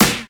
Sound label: natural acoustic snare sound A key